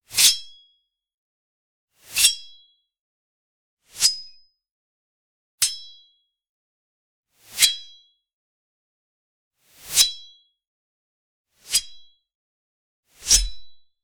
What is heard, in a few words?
a combo of a sword, chop